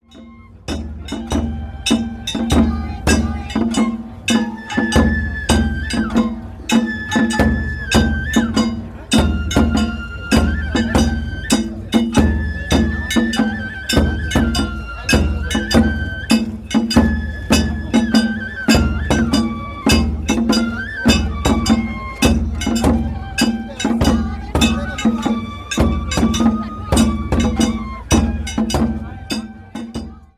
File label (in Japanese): 弥生祭お囃子; 松原町